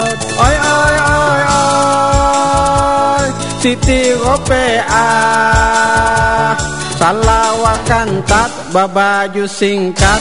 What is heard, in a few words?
banjar, ringtone; Banjar 2